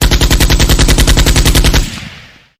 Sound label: weapon sounds